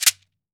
MARACA

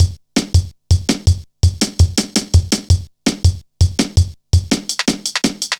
Foot Tapz, Drum Loops 165.5; Zero-G Jungle Warfare 1, 2 & 3

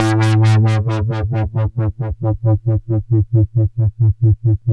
LFO A1; Big Fish Audio - First Call Vintage Keyboards

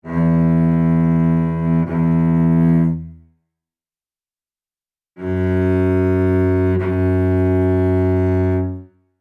Celtic Cello; Sonic Foundry (Sony Creative Software); Continental Drift Aftershocks

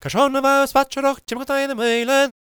GAELIC, World Voices; ILIO; Vocal Planet

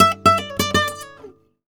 Guitar Picado, Flamenco, f#; Picado 2; Best Service ProSamples vol.46 - Flamenco

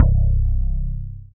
BAS FM DX-7

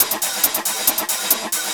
percussion loops 138; PS-45

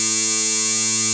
RAVE BEEP; Best Service Alpha Dance vol.2

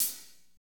HAT, Roland Fast Rock Hi-Hats

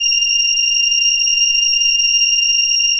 ELKA, String Machines; ELKA RHAP

